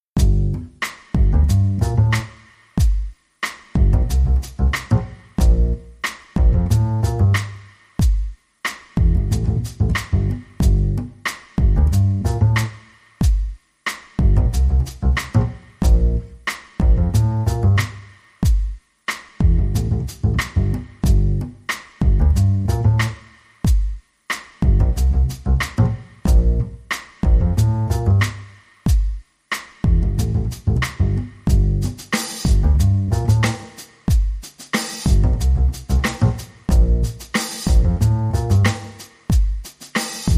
Drum n Bass

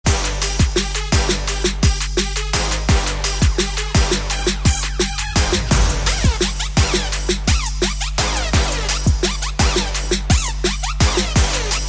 Holy Trap

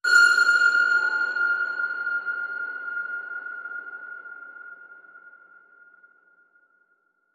Ping Submarine

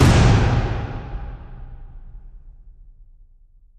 Drum Hit

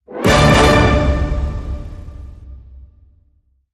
Hit Orch Glam Double D

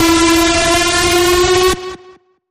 Bend, E; Feel The Bend

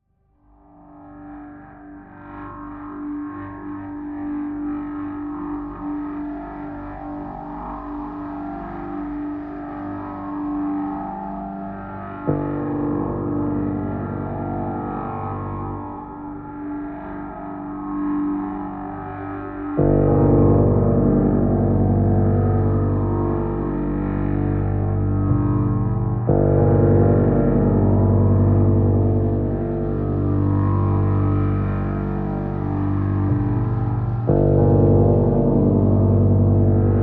Pads Only

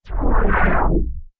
Muted Gurgle Sweep

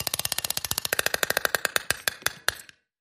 Toyland Music Box Windup

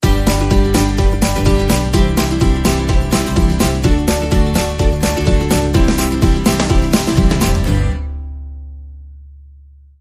Stinger Underscore

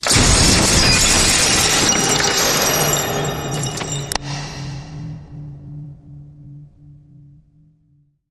Crashy Blast Impact And Debris Snap

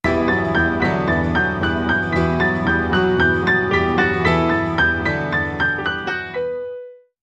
Sting

